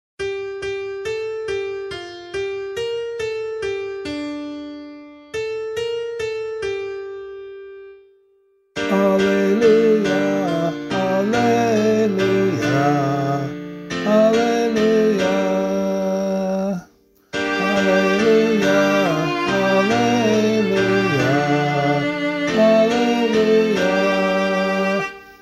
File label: Gospel vocal